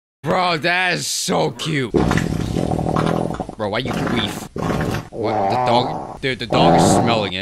man farting at dog

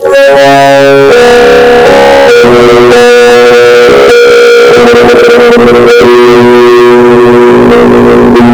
horror bass boosted; roblox

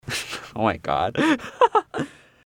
laugh

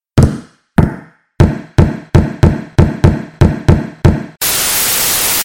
footstep jumpscare

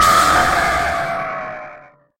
jumpscare sound; onaf 2

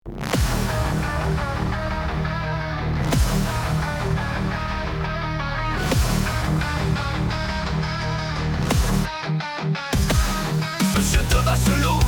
jingle

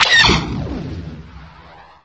tie fighter blaster; lego star wars

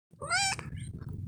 meo kitten

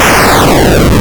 brick game explosion

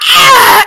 ahhh

